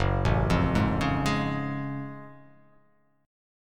Gb Minor Major 9th Gb down; arpeggiated; JGuitar

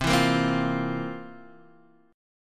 C 11th C down Guitar Half Step Down

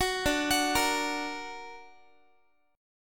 D Augmented Guitar, chord; arpeggiated; 21,22,21,20,x,x; 4A,4E,5A,5D,5G#,6C#

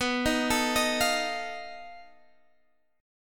B 7th Flat 5th B, guitar chord

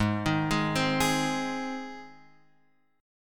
Ab Major Ab, Guitar Open F; arpeggiated; x,3,3,3,3,3; JGuitar

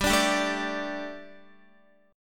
G Suspended 2nd G, down, Guitar; Gsus2; strummed

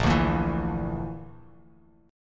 Bb Major Flat 5th Bb down Bass Standard, chord; strummed; JGuitar

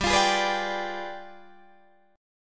G 7th Flat 9th, Guitar, Open D

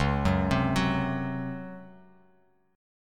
C sharp Major 7th Suspended 4th C sharp; arpeggiated; chord; 9,9,10,11

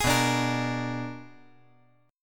B-Minor Major 13th

